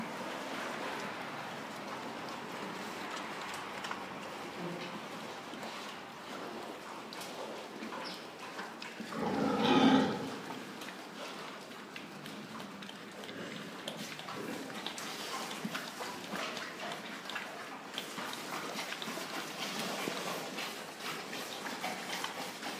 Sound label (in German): Sauen beim Fressen